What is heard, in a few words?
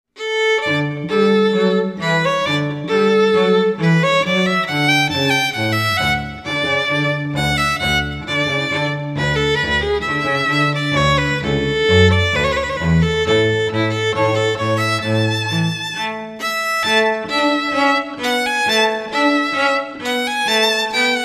march in d major; duo